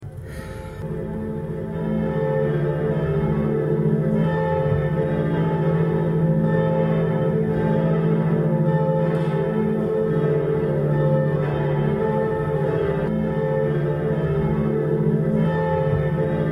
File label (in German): Glocken Martinuskirche